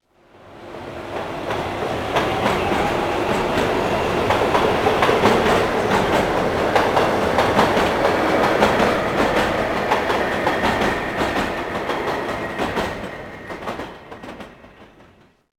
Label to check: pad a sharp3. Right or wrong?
Wrong